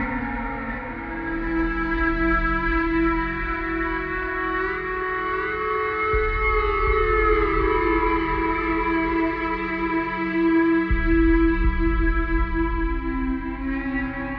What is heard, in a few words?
E-bow Slide, Guitartronics; Oblivion; Sonic Foundry (Sony Creative Software); Ma Ja Le Ethereal Textures